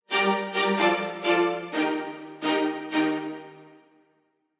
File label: Orch Ens Spiccato; Nucleus